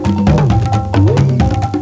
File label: RHYTH, LOOPS